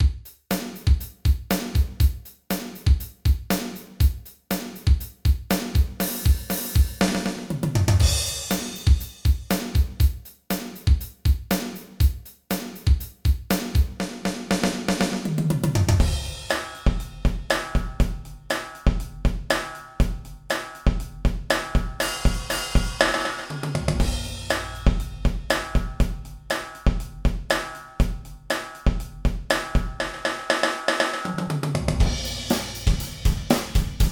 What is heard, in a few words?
Drum Samples